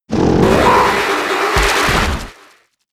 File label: Snore; pokemon sfx gen 7 attack moves sumo usum